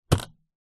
office folder ring click open

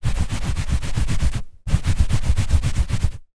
foley rub scrub rug

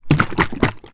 food cooking oil shake in plastic bottle; zapsplat

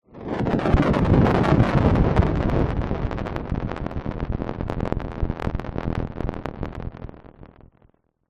sound design burning air pass lo fi; zapsplat

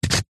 foley binoculars zoom dial single turn; zapsplat

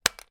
fan button press; household

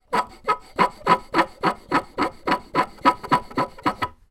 foley wood squeak turn or scrapes; zapsplat

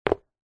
foley footstep single soccer boot on tiled floor

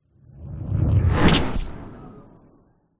sound design whoosh dark fire impact synth growl; zapsplat

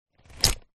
sport buody builder belt buckle undo

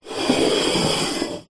cola can full open slide table

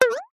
cartoon ping twang; zapsplat

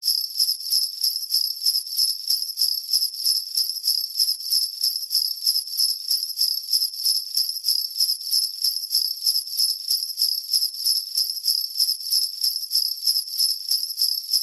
musical sleigh bells med shake

